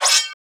Sword; Ed Edd n Eddy; Adromulus Pack; EEnE

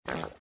Fart

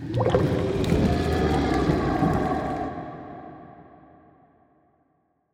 Monster - Belly; Ed Edd n Eddy; Adromulus Pack

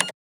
Computer Button Press; Ed Edd n Eddy; Adromulus Pack; EEnE

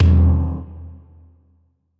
Impact Sink Land; Ed Edd n Eddy; Adromulus Pack

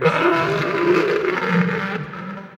Monster - Robot Bounter Hunter; Ed Edd n Eddy; Adromulus Pack; EEnE

